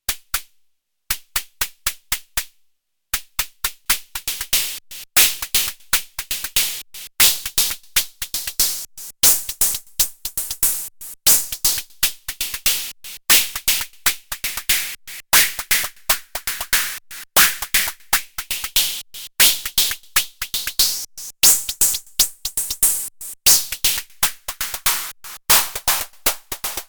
Dreadbox Drips Noise Hihat mit VCA Mod

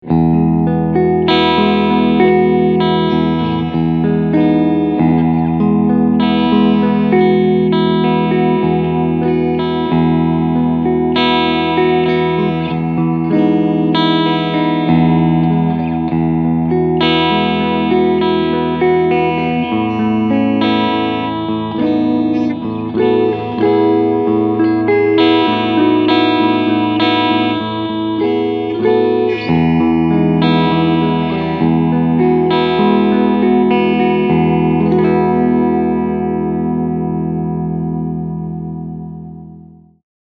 Clean beide SC Modus

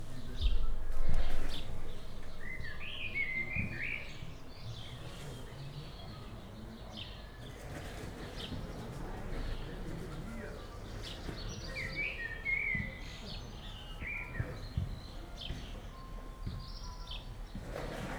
Zoom H4n Pro mit RODE TF 5 Sunset in Fronhausen

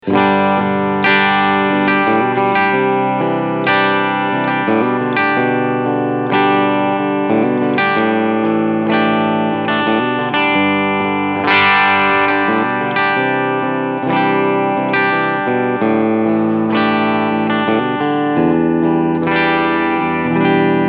Fender Vintera 70s Tele Deluxe Cleansound Steg Humbucker

